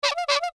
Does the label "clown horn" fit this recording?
Yes